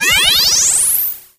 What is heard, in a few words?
laser; lasers